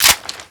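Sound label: weapon foley pp2000 reload clip in; Call of Duty Modern Warfare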